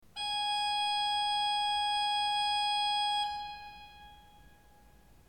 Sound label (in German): ton, orgel